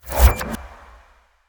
Ice Spell Utility Levitation; Utility Spells; Arctic Aura

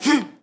Boxing Female Punch Breath

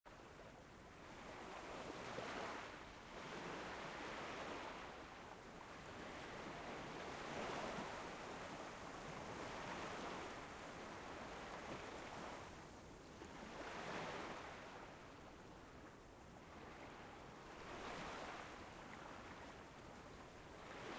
Frosted; Blind Quest - The Frost Demon

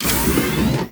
Pickup Scifi Health

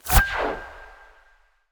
Ice Spell Utility Detect Magic; Arctic Aura

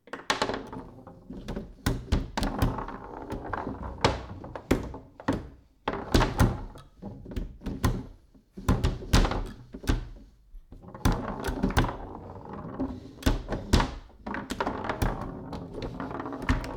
Foosball Play

